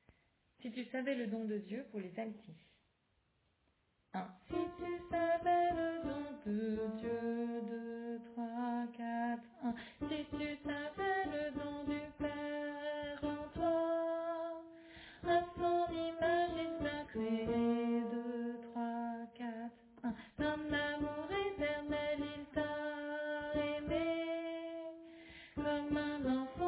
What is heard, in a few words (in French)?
Alto